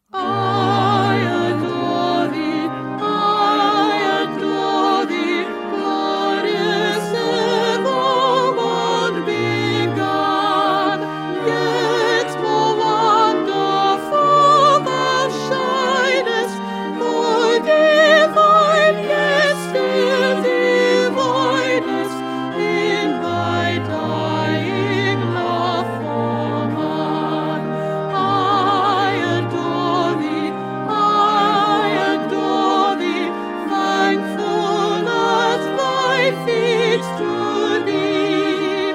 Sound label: Soprano; Stainer; Crucifixion